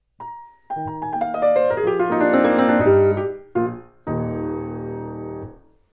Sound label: tom jingle